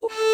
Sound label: oh violin melody morph